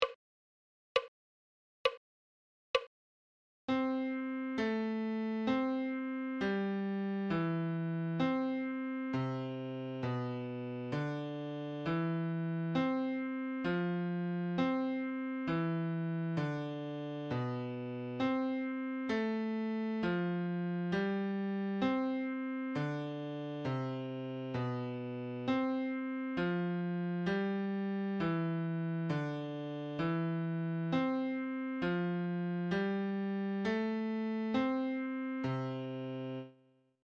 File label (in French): Clé de Fa - Adagio